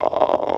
TB THROAT OH, Talk Box

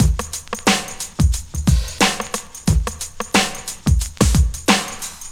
LOOP, Drum Loops

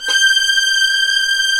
STR SW VLN, Vlns 8 fff, Agitato; String Master Series

